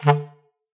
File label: Clarinet